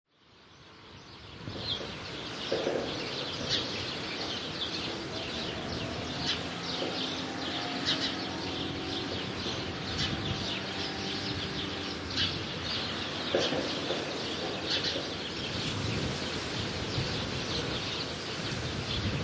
Rural Ambience; Near a farmyard in rural countryside residential area. Birds, occasional cars and dog barking; Outdoor; Lynne Music

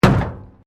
METAL BIN IMPACT PLASTIC CRASH BARRIER

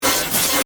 Glitch Static Short; Glitches

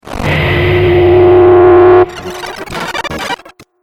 Trailer Hit Glitch; Trailer Sound Effects

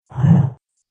Whoosh Slow Motion

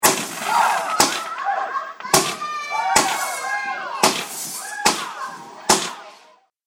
Fireworks Small Firework Launch With People; Firework Sound Effect

